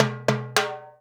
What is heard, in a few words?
FILL, Percussion